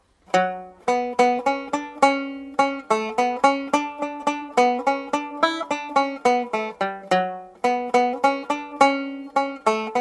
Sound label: banjo